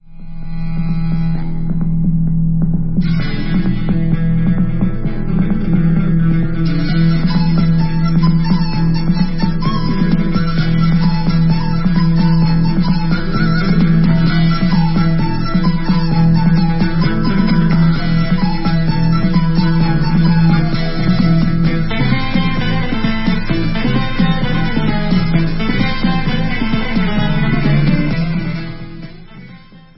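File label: debka instrumental, Israeli Dances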